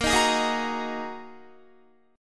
A sharp 7th Suspended 4th Sharp 5th down Guitar 4F 4A 5C 5G 5C 6E; strummed; JGuitar